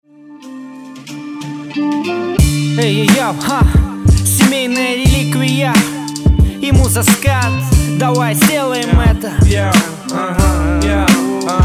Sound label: rap